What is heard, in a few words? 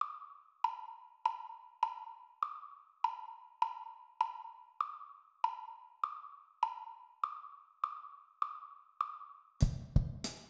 Mixed meter 50 bpm, drum set